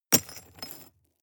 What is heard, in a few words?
drop stones impact